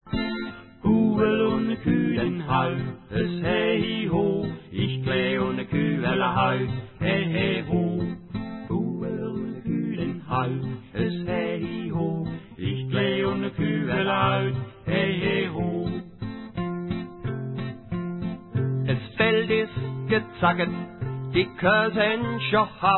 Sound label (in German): die kuh